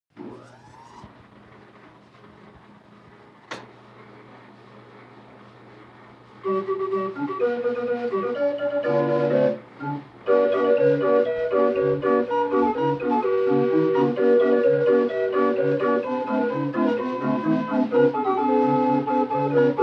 Calliope Switch On

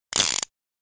Fast Chamber Spin

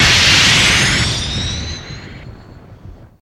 Underwater Laser FX Semi Thick

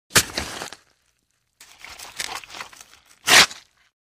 Knife Flesh Twist Out, stab flesh

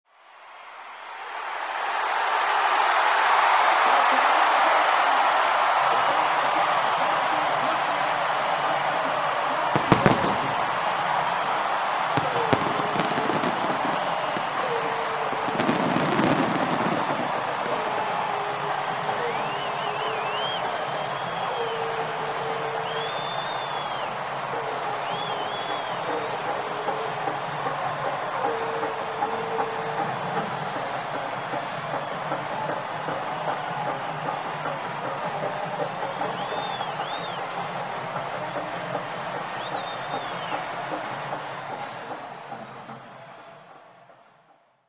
Sports Crowd Big, large

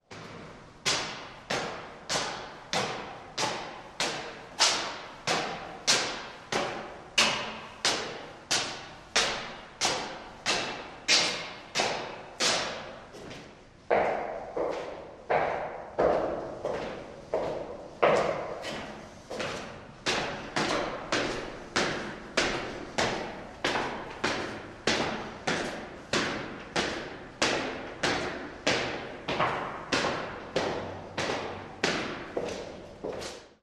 Footsteps, Male Going Up & Down Cement Stairs

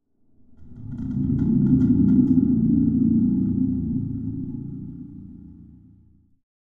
Spring Drum Big Shake

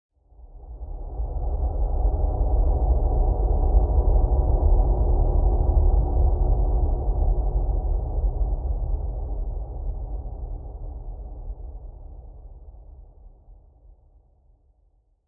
Space Ship Pass By

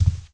FOOTSTEP, singles

